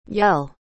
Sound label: yell